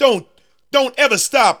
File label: VOX SUNG; Megabass Remix! 2